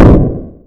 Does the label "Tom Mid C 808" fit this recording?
No